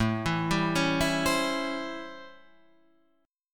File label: A 7th Sharp 9th